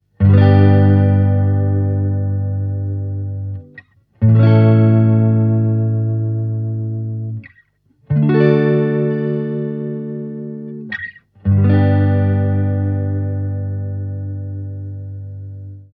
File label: tele channel 1 chorus; fender super champ x